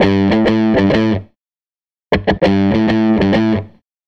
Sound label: SG Guitar Loop